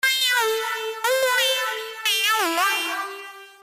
melody

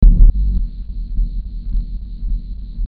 rawnoisebass

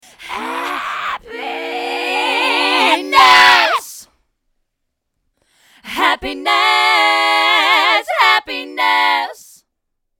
happiness voxreffine

